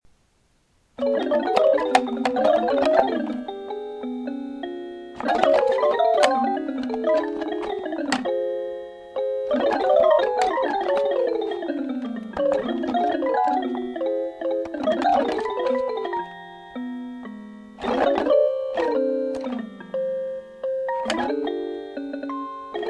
jazz

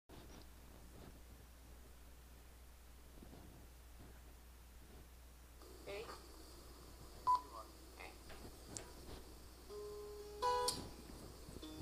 haha

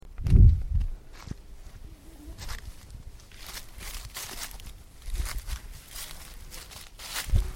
bee, leaves

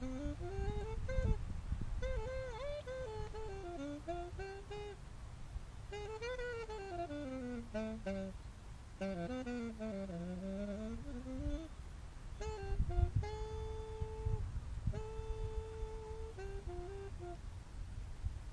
sax by the river

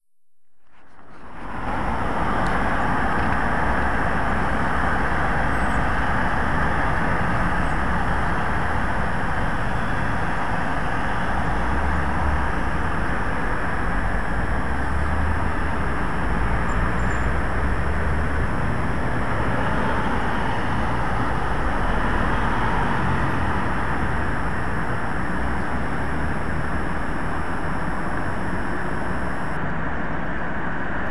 traffic on oystermouth road swansea

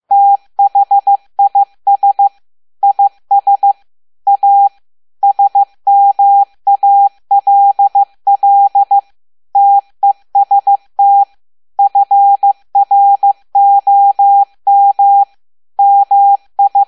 a little morse test

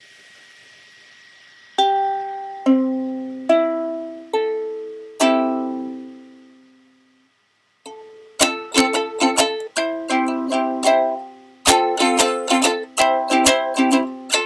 chords c f g

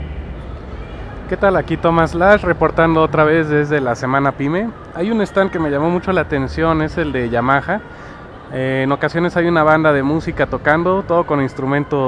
Yamaha silent

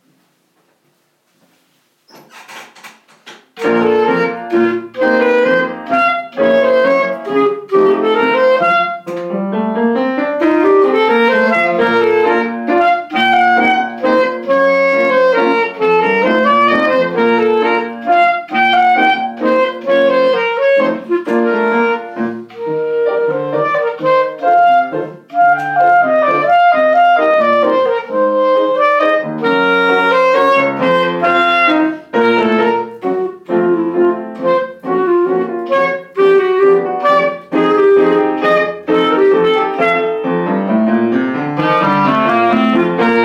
clarinet; clarinetwise

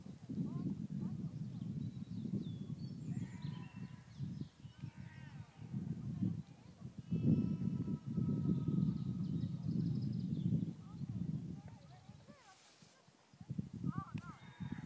very odd noises in the woods

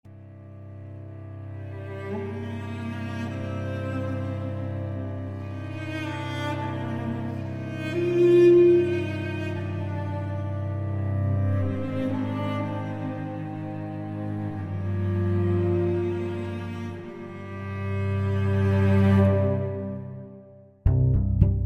strings

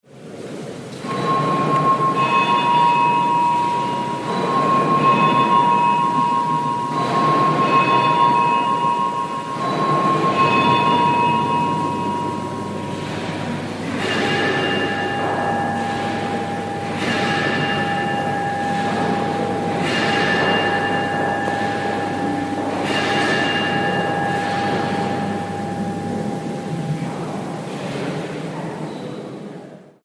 cathedral clock internal striking; wells

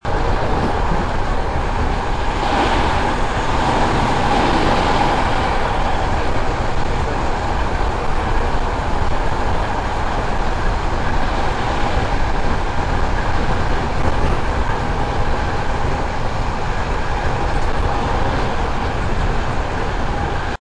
the sound of commutting

